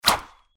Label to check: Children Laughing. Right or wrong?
Wrong